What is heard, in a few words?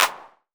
PERC 909; Wizoo - Platinum 24 Electronic; CHR PERC 909